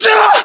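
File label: death, male; localized english; voiceovers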